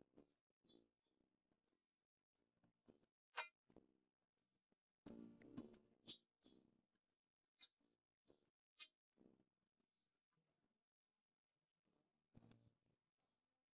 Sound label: guitar